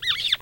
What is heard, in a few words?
Marmoset